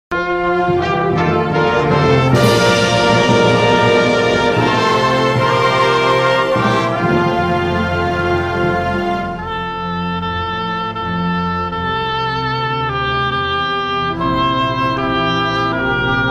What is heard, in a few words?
anthem